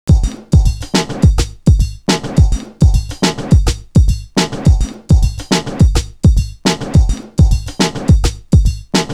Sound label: 105 Ragga, Breaks; loops; 105 BPM; Loopmasters; Jon Carter & Alex Blanco; Block Party Essentials